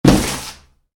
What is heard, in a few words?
Hit box, Box, Cardboard: Impact, Slight Box Movement; Miscellaneous Impact; Stuart Duffield